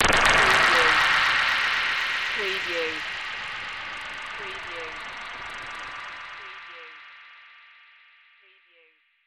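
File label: Alien Bubbles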